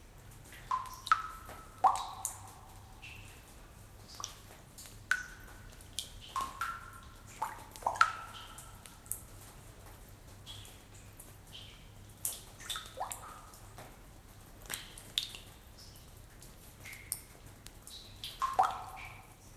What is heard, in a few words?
cave water, ambient underground